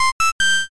rice cooker

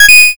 correct

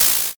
cloth rip

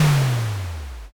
rock crush

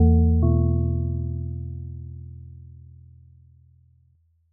china gong

